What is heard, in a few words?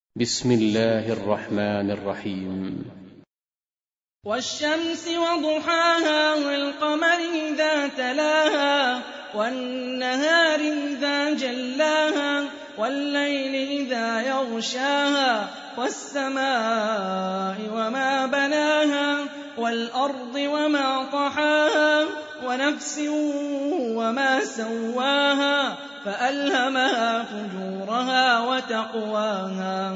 Quran; Hani Refaey